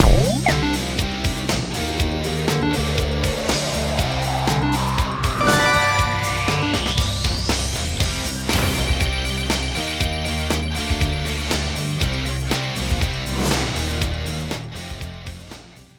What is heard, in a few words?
superwin rock; bigwin; Wild Jack